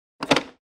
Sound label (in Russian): phone